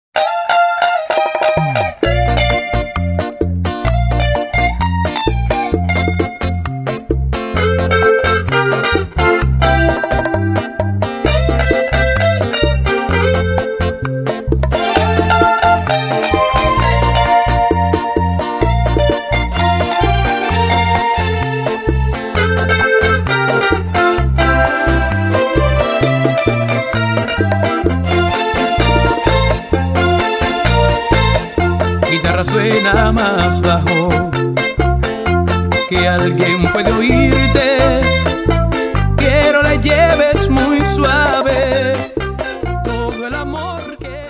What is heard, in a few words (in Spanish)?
Guitarra Suena Mas Bajo; Saul